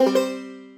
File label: PUZZLE Success Banjo Four Note Fast Wet; stereo; Universal Sound FX